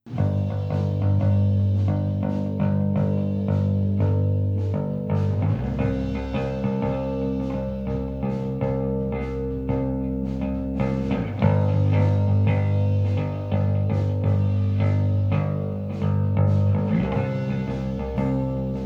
SM57 Bass

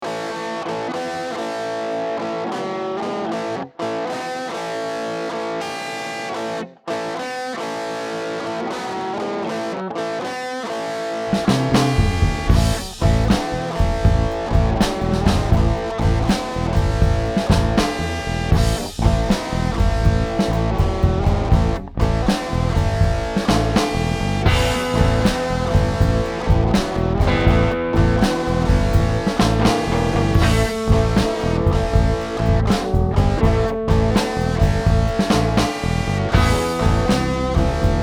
Blues

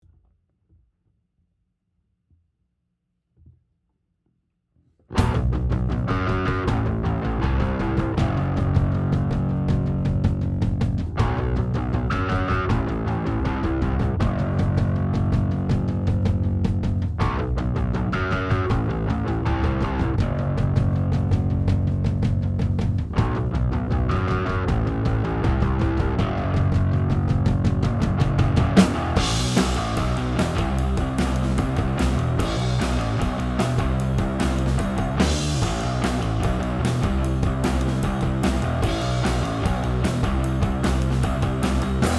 Drums and Bass

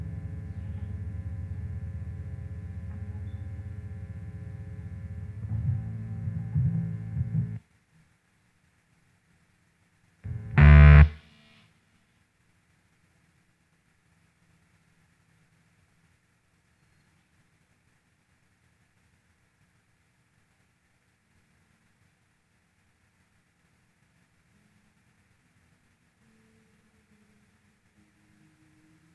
D112 Bass

